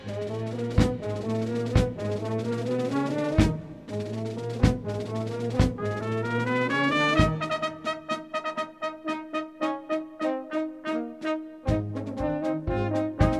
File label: galop